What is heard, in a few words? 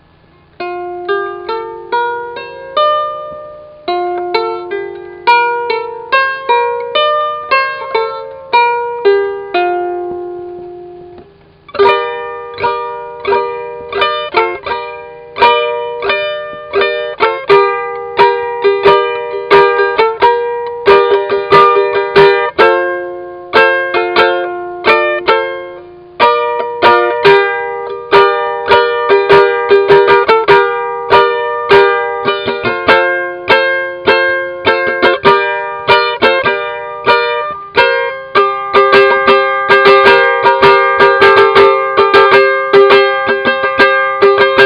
HEXACHORD, lyre